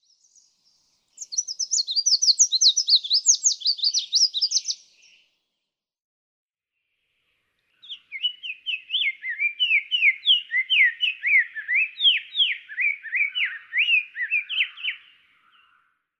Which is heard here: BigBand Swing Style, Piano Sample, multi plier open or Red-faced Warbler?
Red-faced Warbler